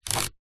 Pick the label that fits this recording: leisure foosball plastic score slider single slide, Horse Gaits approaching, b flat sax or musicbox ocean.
leisure foosball plastic score slider single slide